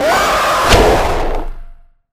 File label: critters jumpscare; nightmare criters